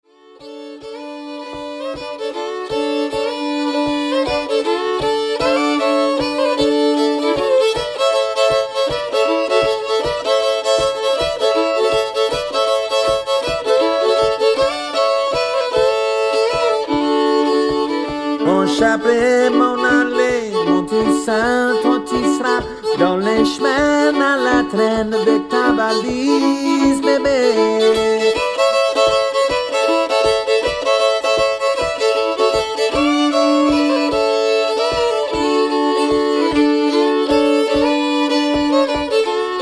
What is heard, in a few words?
Bayou Waltz